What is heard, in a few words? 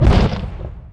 strider step; hl2